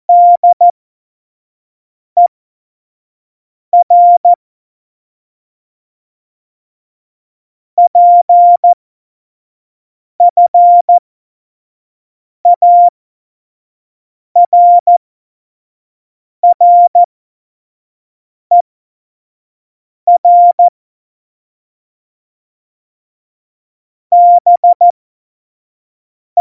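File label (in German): Witz, cw morsen; Morsekurs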